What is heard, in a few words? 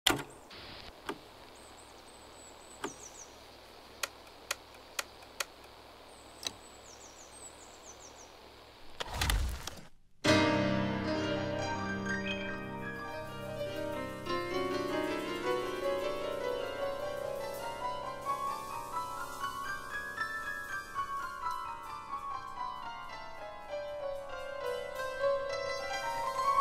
Jazz Fusion Voyage Latin Jazz & Jazz-Funk